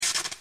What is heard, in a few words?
Electric Sparkle; granny chapter two; android; mobile